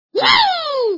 yay; toad

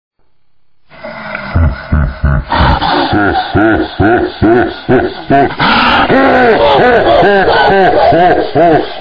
laugh

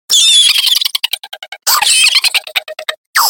laugh

